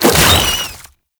Oraxia Brood Spider Jump; Warframe

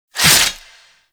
Pilot Finisher Stab In 3D; Grineer Crew Ship

